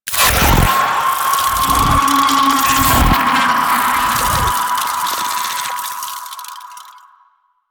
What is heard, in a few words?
Morph; Cinematics; Shrine Intro; Sentient; Warframe; Sacrifice